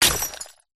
impacts glass small object smash break hit on cardboard